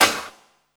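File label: snare drum, Drum & Bass, 168 BPM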